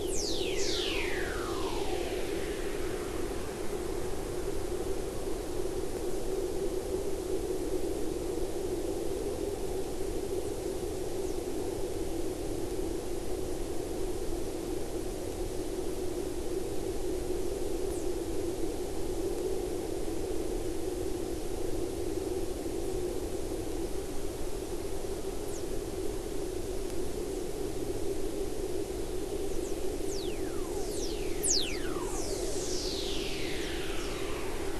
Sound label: WFR audio emfisis